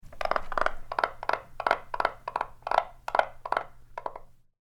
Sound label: Rana pretiosa